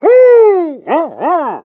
taunt flip; Pyro

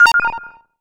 Hitsound electro

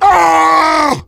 pain severe; Heavy

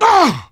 Heavy pain sharp

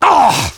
negative vocalization; Demoman

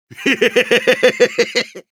Soldier laugh happy, ru

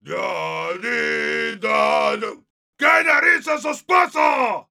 singing; Heavy; es

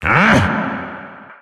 magic backfire

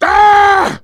Heavy pain severe

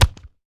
yeti chest hit; Taunt

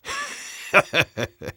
laugh short; Engineer

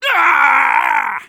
head pain; Soldier; taunt

